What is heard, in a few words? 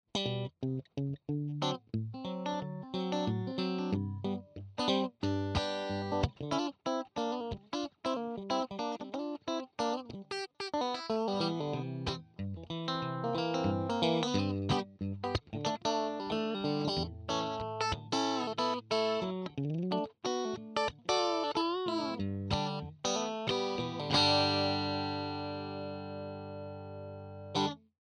crystal, guitar; LLV 66 V CHAMP